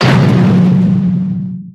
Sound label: Structure Blunt; Damage; The Avali's Audio Overhaul